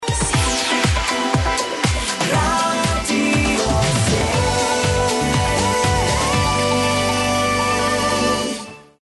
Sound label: Radio